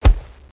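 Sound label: body hit; duke nukem total meltdown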